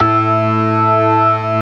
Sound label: STOPS, organ combos